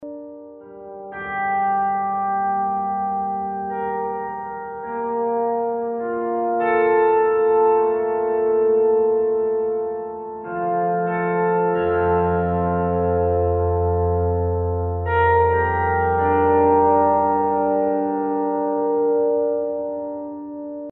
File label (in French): piano nappe orgue; best service galaxy piano 2